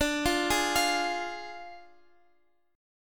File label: D Diminished D, Guitar, Open F; Ddim arpeggiated; x,x,14,12,11,12